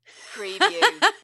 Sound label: Lady Short Laugh